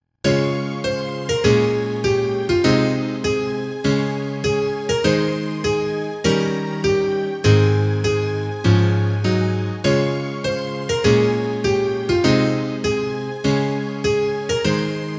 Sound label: instrumental